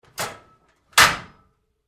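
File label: Door Locker Open close-up Med, Metal Door; Metal Doors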